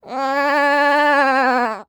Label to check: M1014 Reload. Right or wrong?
Wrong